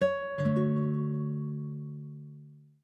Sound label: Wireless Charging Finished Acoustic; LG Wing 5G SKT